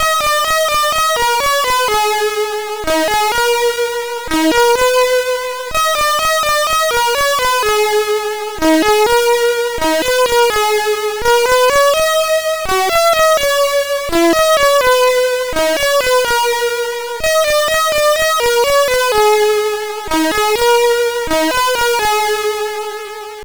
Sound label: Fuer Elise sid CSG8580R5